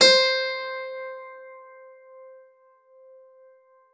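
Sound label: harpsichord C5; KLAVICYMB; Xsample VOL-8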